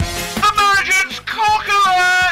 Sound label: alert; emergence